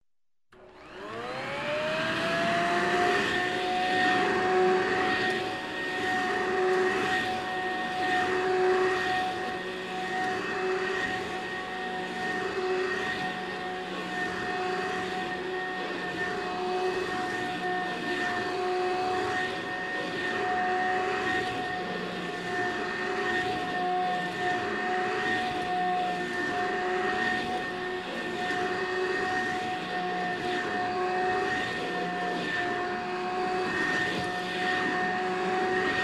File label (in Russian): pilesos pusk rabota ostanovka srednie oboroti